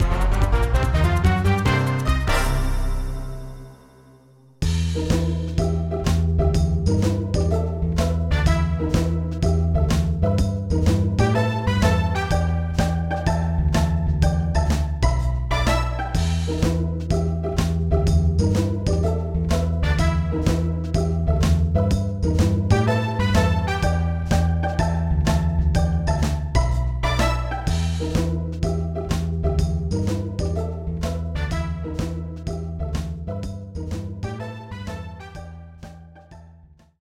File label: Game Level Complete; penguins of madagascar the 2010 nds